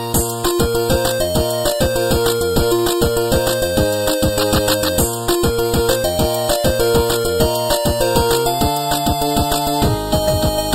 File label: theme 8 bit